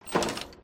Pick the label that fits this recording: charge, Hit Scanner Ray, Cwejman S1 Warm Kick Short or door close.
door close